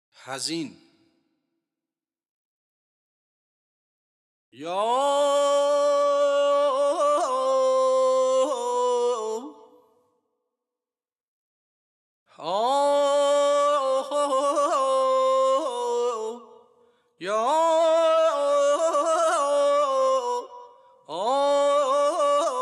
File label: Hazin Afshari Radif-e Karimi; Avaz Afshari